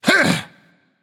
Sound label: grunt attack; chronos